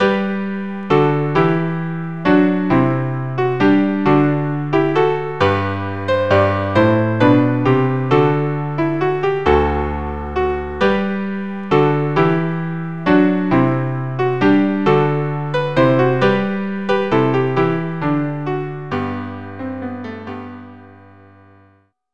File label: trio; frog galliearde